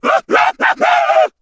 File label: groan, zombies